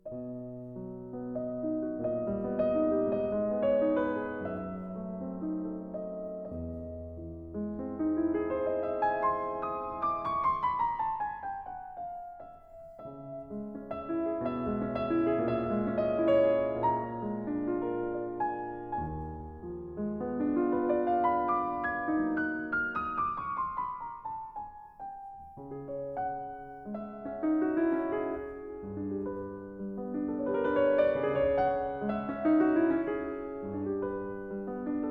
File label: Cantando espressivo senza slentare - Stretta quasi presto; DG